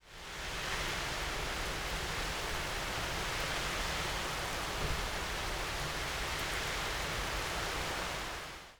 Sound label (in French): Pluie forte